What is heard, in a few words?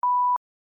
Test Tone 1kHz